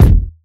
kick one shot E key; kick drum samples

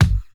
focused kickdrum sound g key

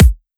sharp kick drum a sharp key; Duration 0:01; kick drum samples

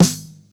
dark sounding snare one shot f key; snare-drum-samples

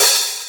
open hat c sharp key

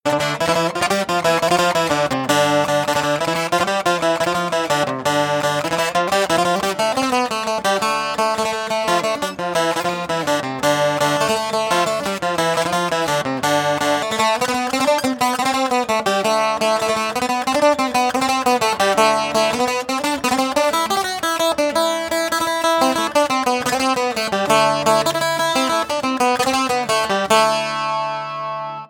irish bouzouki folk traditional pickup sequence; loops

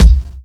present bass drum one shot c key

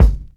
deep kick drum g sharp key

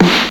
old school damped acoustic snare sample f key; snare drum samples

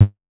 boom kick drum g sharp key

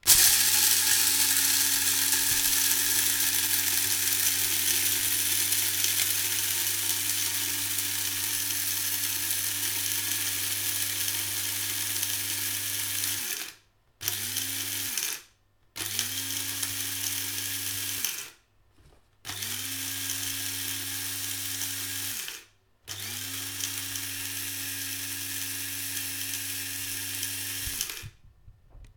small cofee grinder

